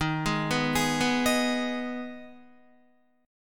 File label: Eb Suspended 4th Sharp 5th, Guitar; Ebsus4#5 arpeggiated; JGuitar